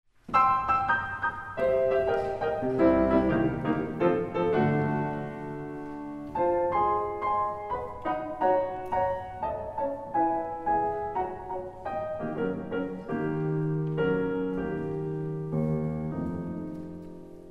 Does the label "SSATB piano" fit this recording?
Yes